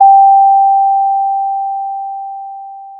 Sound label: Sound of a doorbell